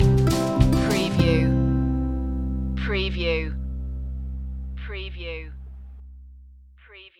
happy tune loop end

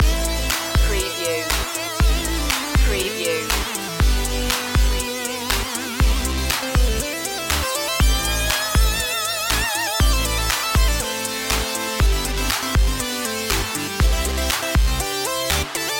electro chip loop

